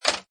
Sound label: Pinball Sound Dual Clank; Video Game; Games